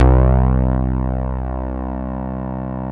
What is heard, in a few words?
POLY C2, SYNTHESIZER; GLUNGE